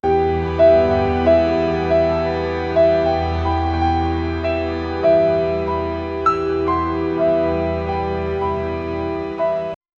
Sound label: hopeful piano music